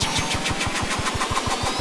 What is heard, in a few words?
gambling spin start; BARs 7s; wazdan